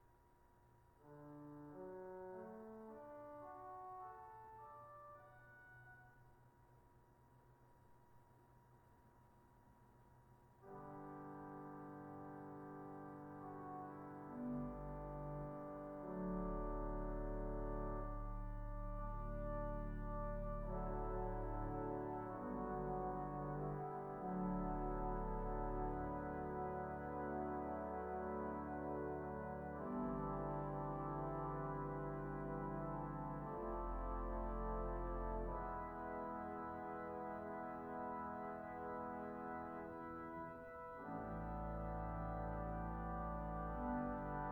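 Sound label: swell voix celeste, organ; 8’ Vox Celestes; The ubiquitous Voix Céleste is typically a single rank of pipes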